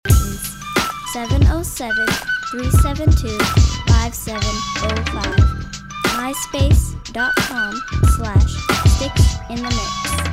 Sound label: flute beat